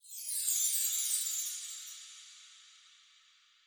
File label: chimes; netent